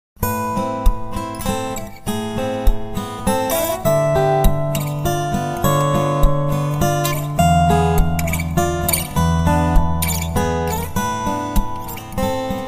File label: pickup sample